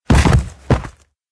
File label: bodyfall; smite; kukulkan